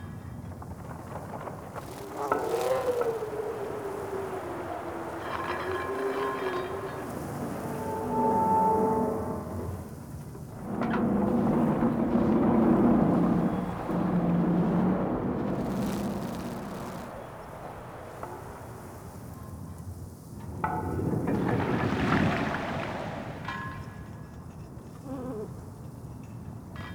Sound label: Town Foreground Amb Loop, ambience; Duviri